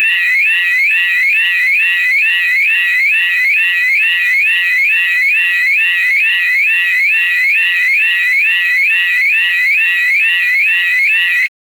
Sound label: a high pitched warning alarm in a sci fi spaceship